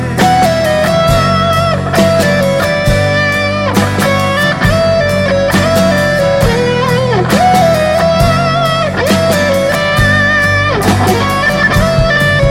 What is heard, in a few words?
Sad Solo